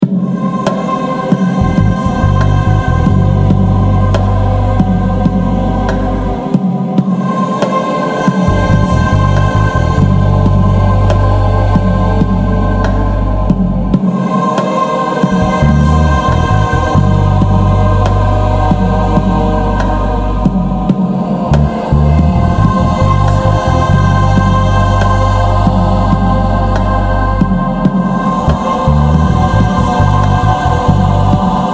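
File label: Harmonic Minor groove, bass; a bassists guide to modes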